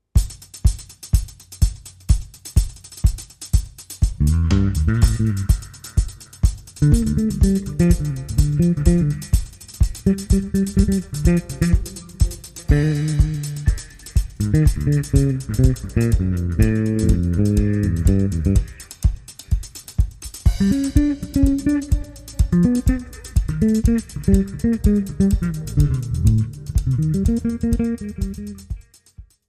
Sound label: Bass Virtuoso